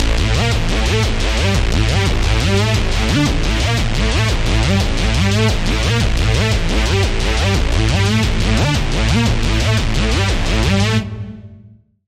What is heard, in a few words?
Lead; Routed Sound; Serum 2 Expansion - Quantum Enforcer Vol.1